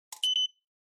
Ticket Gate Beep Tone1

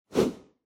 Camera Motion High; カメラモーション; シーン・カメラモーション

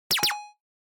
Percussive Accent Timbre; 打楽器アクセント

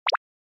Anime Motion High

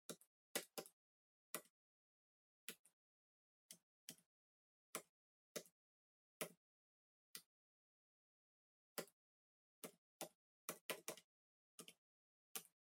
PC Keyboard Far Arrow

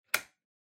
Wall Outlet Wall Unplug

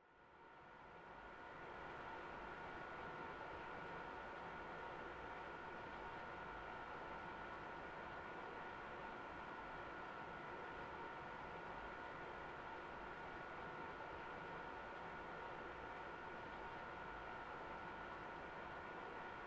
PC Operating Noise Far Long

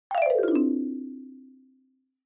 Xylophone Fast Long 1 Down

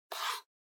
Trackpad Swipe

